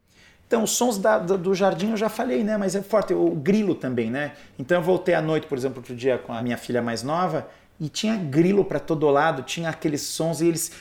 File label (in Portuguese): Alto XV Sons Jardim